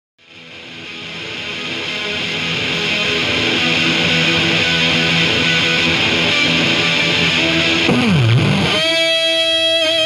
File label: Guitar Solo